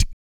Vocal, Blues & Soul; ILIO; Vocal Planet VOL-2

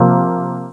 chord

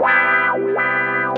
GUITAR

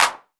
TR909 Clap, Roland SH-32 Drumset

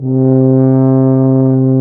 TUBA; Sound & Vision - Gigapack I CD 2 (Roland); Brass&Woods2

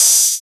Open Hihats, Cymbals

